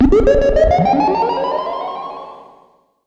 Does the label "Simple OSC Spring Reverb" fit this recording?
Yes